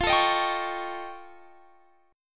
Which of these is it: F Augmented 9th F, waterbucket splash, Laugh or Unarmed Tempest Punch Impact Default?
F Augmented 9th F